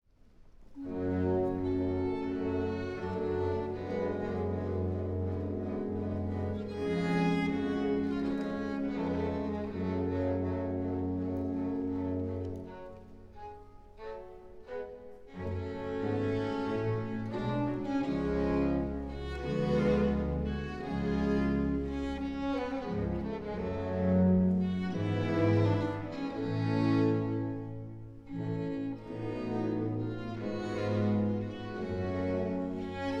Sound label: baroque orchestra live haendel israel symphony; new baroque orchestra berlin